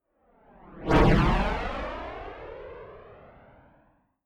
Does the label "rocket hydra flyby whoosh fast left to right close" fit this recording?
Yes